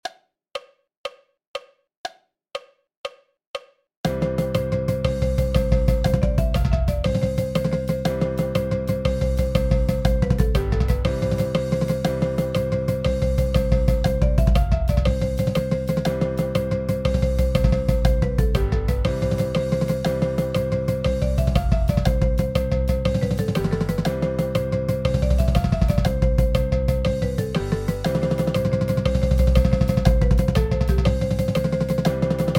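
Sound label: 120 BPM Cheesy Rolls with countoff, Marching Percussion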